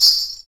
METAL, Afro Shakers Ankle Pods; Roland LCDP14 Africa VOL-2

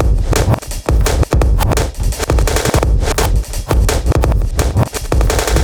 break 70bpm

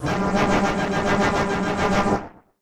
Casio, Glitched, malfunctioning synth

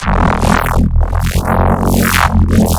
reese, bass

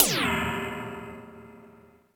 FX RIDE; Greytsounds VOL-1

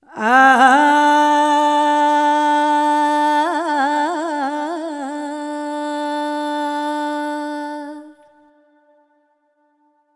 Vocals Male, World Pop; Sonic Foundry (Sony Creative Software)

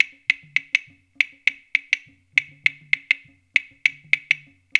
Haitian Congo, World Dance; Spectrasonics - Supreme Beats

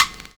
HORSE BEA; Univers Sons

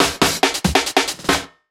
Fills, Loops 140 BPM

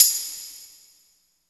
VERB R; East West - Phat & Phunky

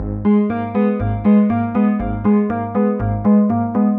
Synth Pattern; Electrocution

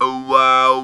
Talk Box "wow"

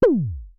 Synare Filter; Star Instruments Synare 3

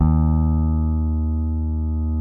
P BASS SUSTN MP D#2; Sampleheads; Will Lee Bass Library

